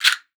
RASSEL; Xsample VOL-9

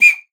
TRILLER, percussion; Session Instruments; Best Service ProSamples vol.42

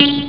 trampolin; ST-XX Amiga Soundtracker Sample Packs

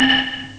Blech; ST-XX Amiga Soundtracker Sample Packs

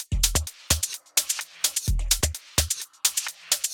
Drum Loop 128BPM Full ohne Clap; Vengeance - Essential Clubsounds Vol.5; VEC5 Drumloops; VEC5 Multi Loops 128BPM

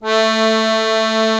AKK VIOLIN A, akkordeon; Best Service; Alpine Volksmusik

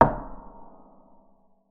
KLOPFEN, SPINET; Xsample VOL-7

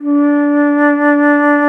FLOTE SOFT D; FLOETE; Best Service; Alpine Volksmusik

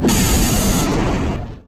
ROBOTE; Best Service; Advanced Mega Effects

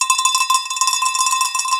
ALPENSCHELLE; Best Service; Alpine Volksmusik

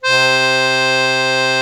AKK ORGAN C1, AKKORDEON; Best Service; Alpine Volksmusik

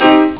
piano dur; ST-XX Amiga Soundtracker Sample Packs

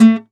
KONZERTGIZ, Alpine Volksmusik

